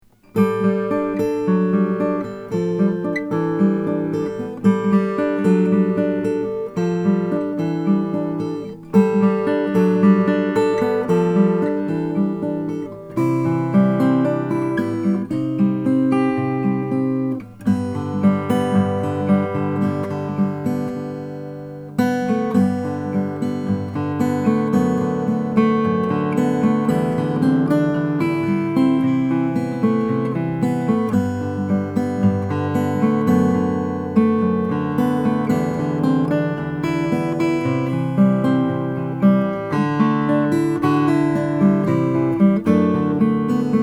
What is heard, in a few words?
santa cruz custom 000 12 cocobolo